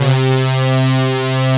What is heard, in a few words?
PROPH LD; Pro-Rec - Synthsonic; TRINITY